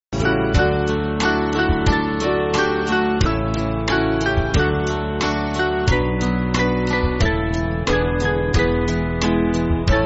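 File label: Music Backing Files for Churches; Swedish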